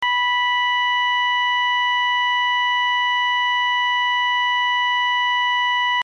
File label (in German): ton, Signalgeraete